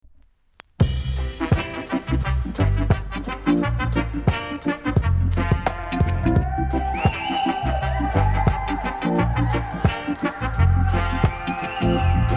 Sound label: REGGAE MUSIC